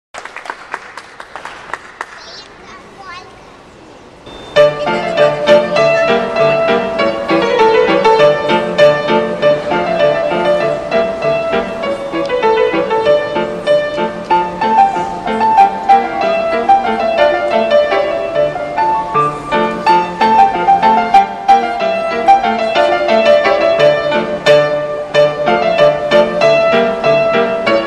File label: Glinka Polka